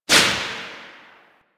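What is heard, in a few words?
submachine gun Tail Indoor; Warframe; Tenno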